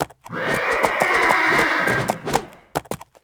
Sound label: attack; Rust